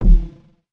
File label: UI Menu Click Scroll